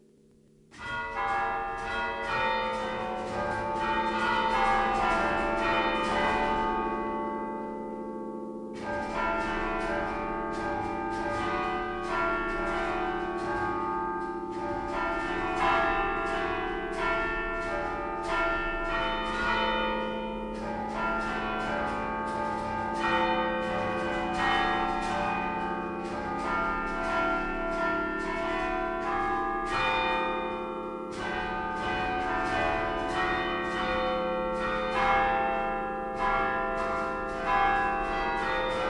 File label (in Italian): Sant Olcese, Campane; Genova; Liguria; Archivio Balma Campane Liguria; Polifonia